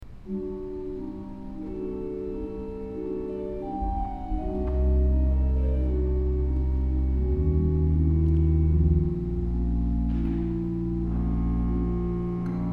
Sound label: Organ